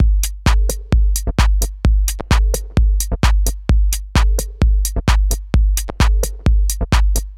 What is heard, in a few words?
130 Bpm Minimal Beat